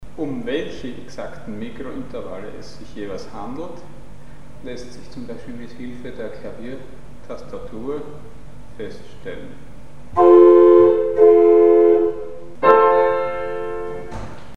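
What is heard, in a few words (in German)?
Chromatische zwölf Töne; Mikrobuch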